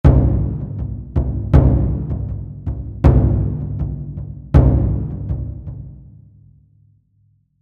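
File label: Lowered 2 semitones with pitch-shifting, Ohdaiko; taiko; TAIKO THUNDER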